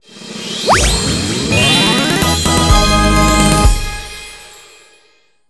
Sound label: CARD PASS UP PLATINUM; maimai